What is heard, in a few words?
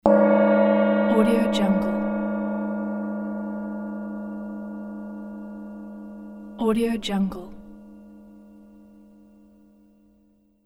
gong crash